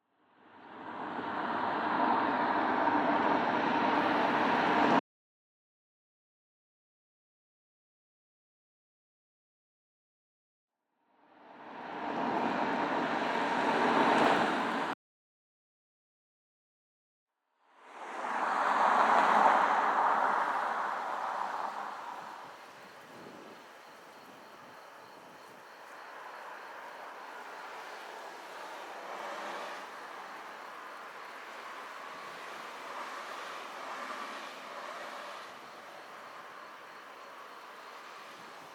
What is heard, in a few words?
three short bursts of car noise and birds; Salas Birds